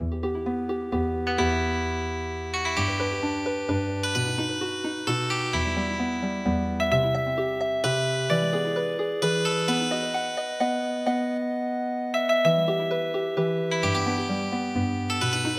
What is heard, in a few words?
Healing Harp, traditional; The House of the Rising Sun